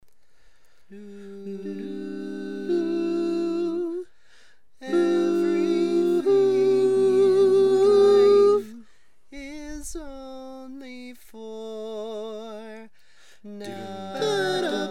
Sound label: Tenor, barbershop tags